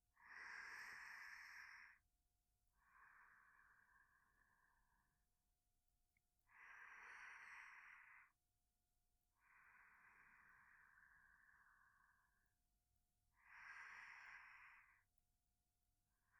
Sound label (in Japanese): 左吐息; 効果音なしバージョン